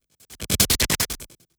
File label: Strobe Transition